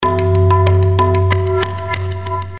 TABLAS

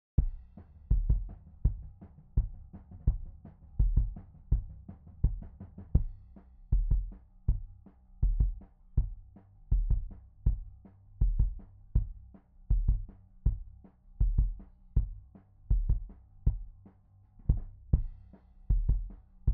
Seven Kik Out; Seven creatures down

